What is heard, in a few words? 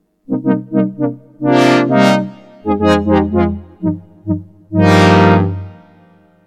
brassy